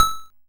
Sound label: Xylo E3; multi-samples; CHIPSHOP DELUXE